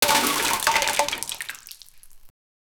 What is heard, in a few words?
multiple diamonds being p; items